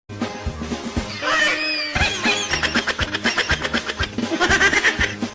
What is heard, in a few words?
laugh; goofy ahh